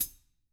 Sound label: DW foot, Drums; DW KIT1; ILIO; Double Platinum Drums 2